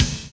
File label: DOUBLE BD; Univers Sons